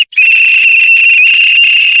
referee whistle

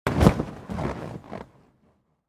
gymnastics mat roll; SFX; DailySounds

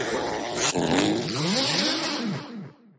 wrench tighten; Foley; DailySounds

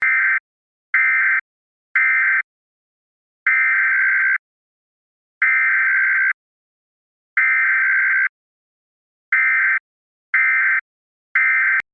Siren Head Emergency Alert

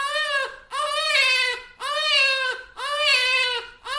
insane laughter; Horror

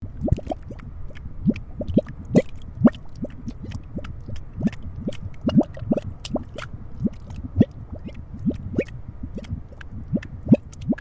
tar pit slow bubble; SFX; DailySounds

